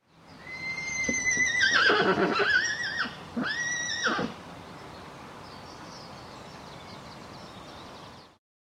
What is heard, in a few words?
Horse Nay; 100 sound effects